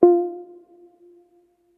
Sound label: Menu Select; Martian Chess